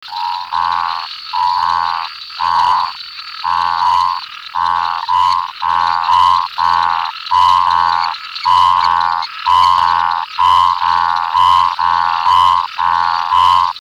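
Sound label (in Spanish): Ejemplo de sonido, ranas y sapos del sur de Brasil y Uruguay; Editores Científicos: Axel Kwet & Rafael Márquez; Fonoteca Zoológica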